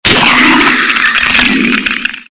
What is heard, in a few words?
skeleton dead; Skeletal Ravager